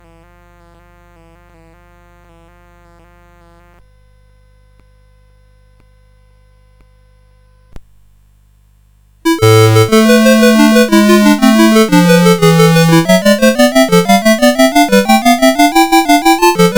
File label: English Suite 2 Gigue, CSG8580R5; Lord UK